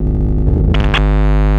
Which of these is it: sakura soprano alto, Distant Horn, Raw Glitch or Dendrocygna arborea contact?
Raw Glitch